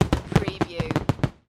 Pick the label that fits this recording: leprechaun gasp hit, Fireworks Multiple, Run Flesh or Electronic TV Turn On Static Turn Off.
Fireworks Multiple